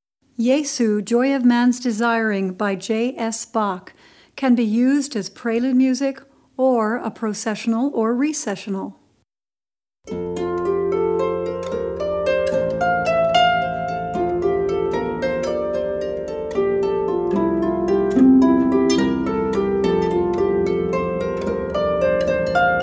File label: harp